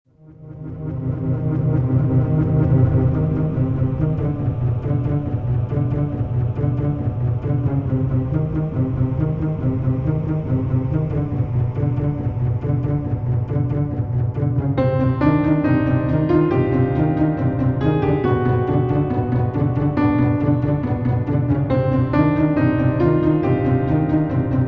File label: Haunted House